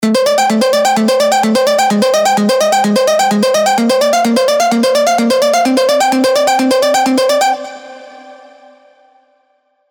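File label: Amin (128 BPM), progressive arps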